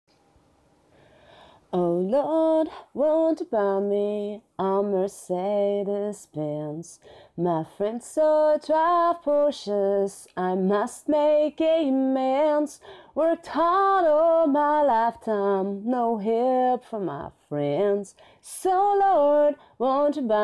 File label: Acapella Singing; F4M